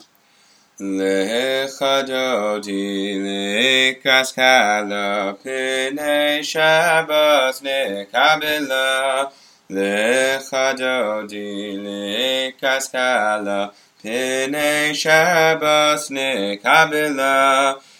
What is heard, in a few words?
Lcha Dodi Classic Melody